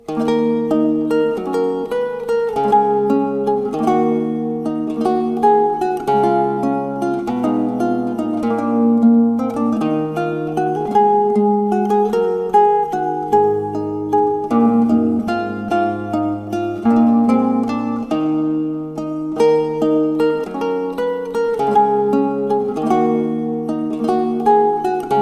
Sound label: medieval english lute